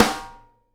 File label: snare GLASS, Live Snares